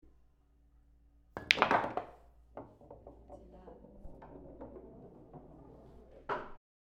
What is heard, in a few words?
Pool Ball Sink